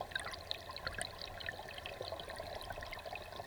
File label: Waterway E Dark